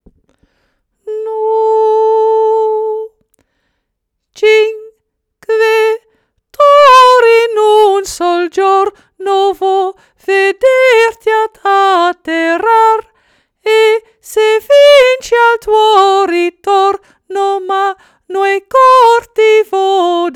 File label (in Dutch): A maat 61 tm 77; koorleden